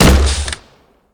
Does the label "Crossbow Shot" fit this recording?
Yes